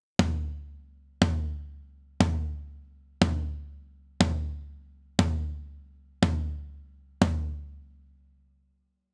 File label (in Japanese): ドラム サウンド FTOM 16